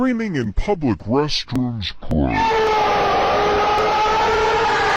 screaming in public restrooms aaaahhhhhh

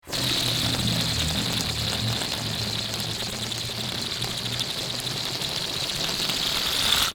circus baloon deflate

